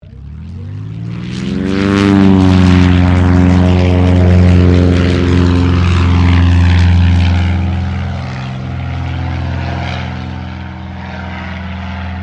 Aircraft CT4A Touch And Go, RAAF CT4 training aircraft; Airplanes; Vehicles; SoundEffects-Foundation1